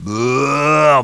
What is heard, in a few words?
bear; Bearrr ! (Stallone)